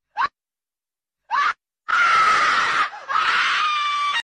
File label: Crying and Screaming; SpongeBob